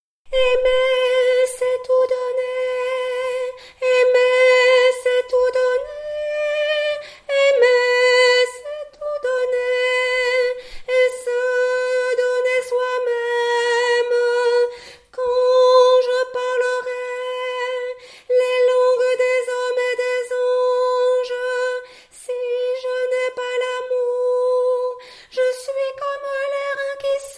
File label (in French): Mariage T, Tenor